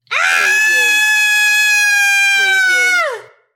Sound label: Female Scream